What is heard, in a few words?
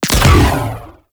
Disc Fire; Warframe; Corpus; Corpus Railjack; Spec Ops Riot Biped; Riot Moa